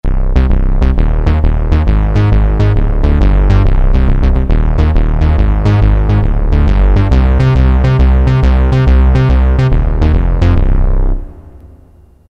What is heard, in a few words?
Syn Bass, Roland MT-32